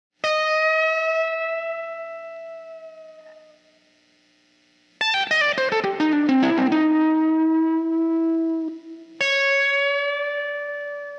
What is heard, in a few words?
lead guitar; masaakiwada